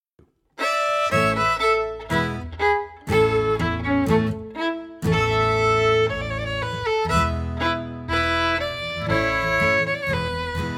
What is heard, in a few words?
Processional, fiddler